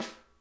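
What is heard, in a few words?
center, snare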